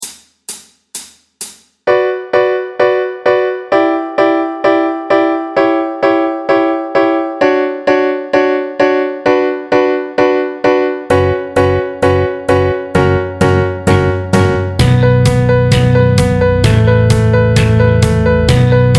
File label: Epic Neoclassical Metal Etude! (Backing Track)